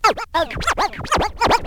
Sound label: SCRATCH; Hip Hop; E-MU Formula 4000 Series Vol. 1; Hip Hop Nation